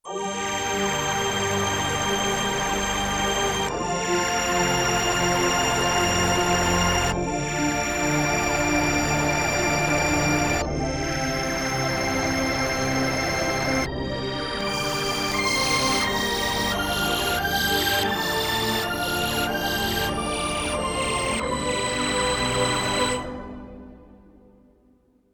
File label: Waterorgan, Yamaha TX16W; Spacey Keys